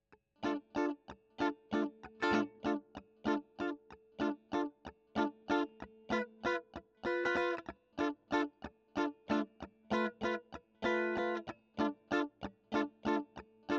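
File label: Electric Guitar; Royer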